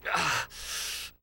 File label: aahhzz